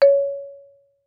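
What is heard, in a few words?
Marimba rubber fortissimo Db5; MIS Pitches - 2014; Percussion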